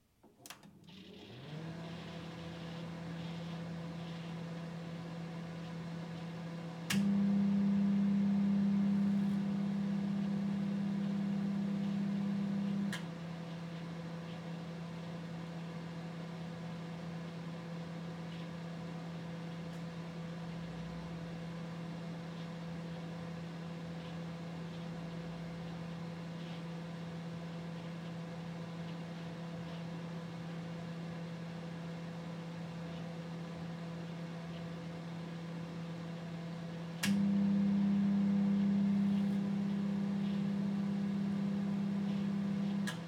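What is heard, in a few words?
mikrovolnovka lg, microwave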